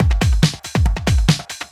Loops BB 140, BreakBeats; Vengeance Samples

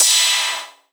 Ride, Cymbals; Vengeance Samples; VEH2

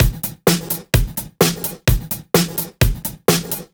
House Beat, 128 BPM

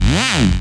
FX Scratching; Vengeance Samples

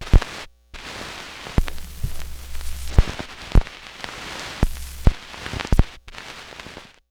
Record End, Vinyl Record

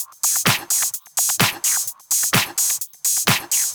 Electro Loop 128 BPM; Vengeance Samples

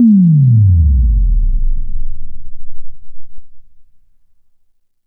FX Impact; Vengeance Samples

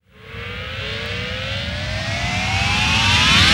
reverse, FX; Vengeance Samples

